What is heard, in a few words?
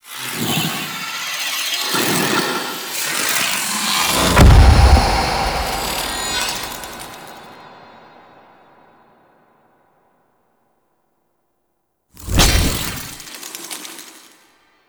Spark Lift; Warframe; The Second Dream; Stalker; Cinematics